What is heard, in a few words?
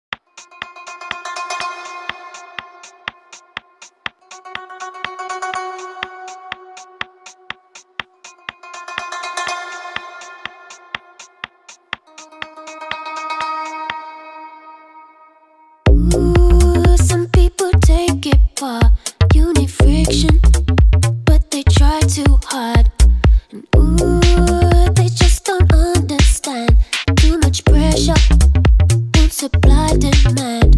Jazz; Coral Jazz; Automatic